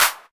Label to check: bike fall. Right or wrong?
Wrong